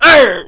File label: arrrgh; Army Men; GreenUnits